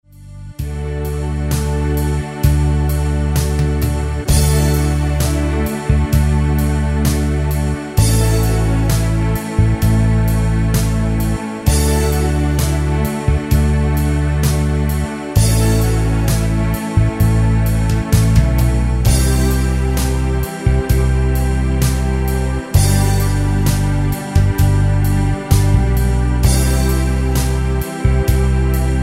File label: Backing standard 130 bpm